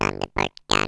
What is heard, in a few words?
Robot Oneshot; BedRoom